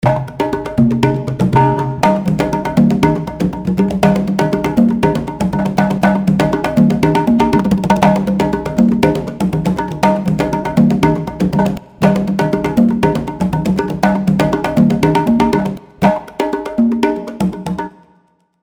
8 Bongos 8 Congas; Arcade Rhythm Culture

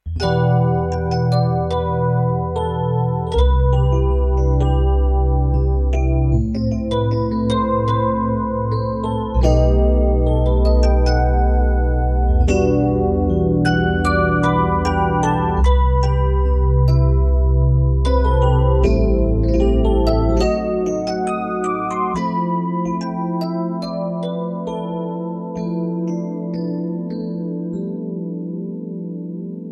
E piano Dyna Chorus

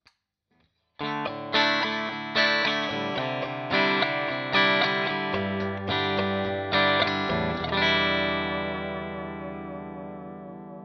Clean Pull Push

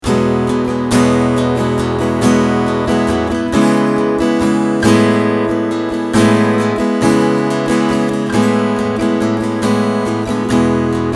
Strumming Preamp

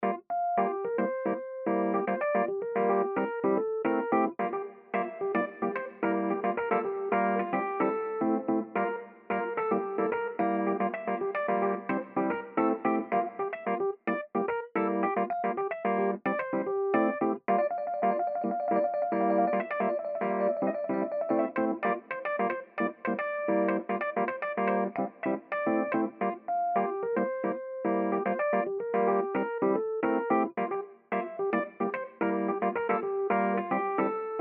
rhodes

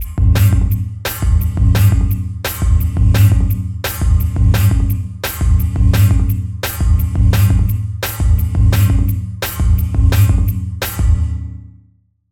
Gong Amp Beat Gong Trip Drive; AudioThing Hainbach Bundle

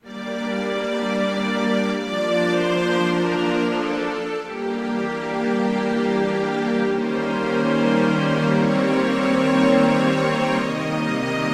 Oct Grand Legato Strings; Combi102

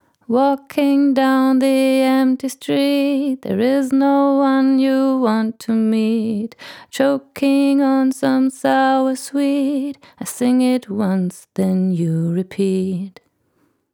Female Vocal; Tegeler Audio Vocal Leveler 500

